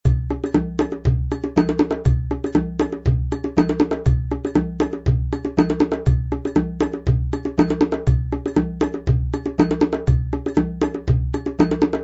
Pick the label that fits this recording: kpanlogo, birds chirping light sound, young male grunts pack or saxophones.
kpanlogo